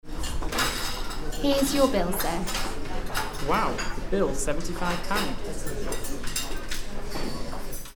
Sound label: Restaurant